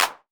PERC 909; Wizoo - Platinum 24 Electronic; CHR PERC 909

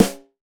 Jazz Snare; Roland BK-5

